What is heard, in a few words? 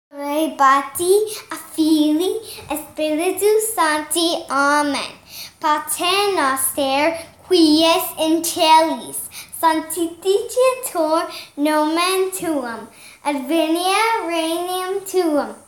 Latin song, Our Father(in Latin)